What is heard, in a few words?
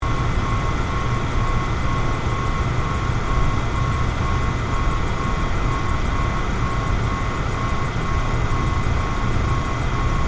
Cooling Unit, Industry, Industrial, Air, Conditioning, Cooling, Unit, Fan, Motor, Run, Loop; Restaurant; SoundEffects-BlastWave